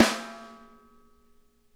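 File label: center, snare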